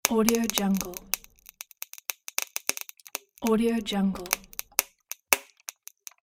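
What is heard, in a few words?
faulty fluorescent lamp light tube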